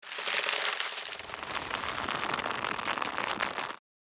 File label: Rope tighten and stretch short